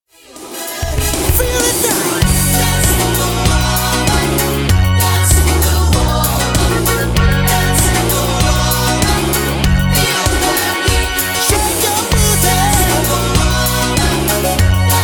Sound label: warmup song